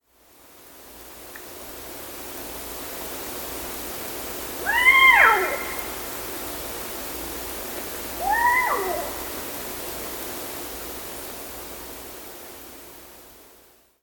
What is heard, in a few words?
Eastern Screech Owl, Megascops asio; owls